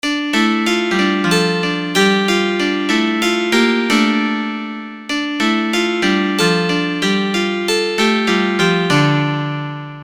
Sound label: MU50 Hammer Time